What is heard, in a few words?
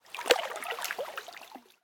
lake; CS Level2